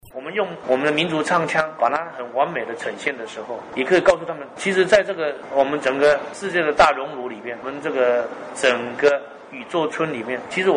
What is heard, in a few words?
Vocal Music